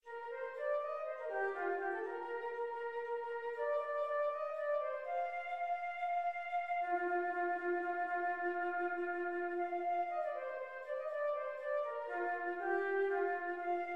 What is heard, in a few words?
short victory flute